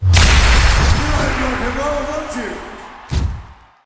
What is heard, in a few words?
large Win